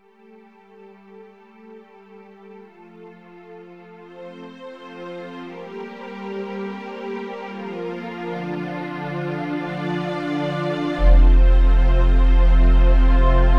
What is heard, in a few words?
fanfare